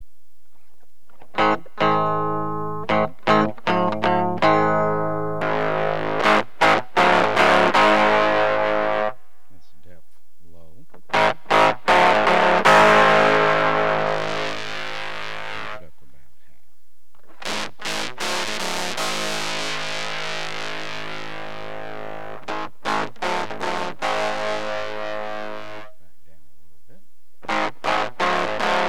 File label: fuzz